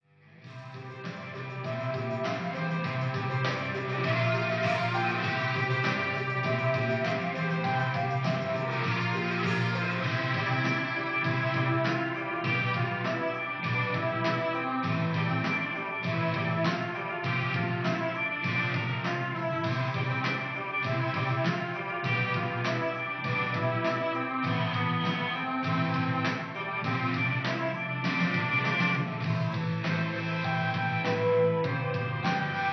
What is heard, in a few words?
Rock; Usen; Fist of the North Star Lost Paradise; Hokuto ga Gotoku; PlayStation 4 gamerip